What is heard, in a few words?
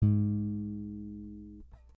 string6 g sharp1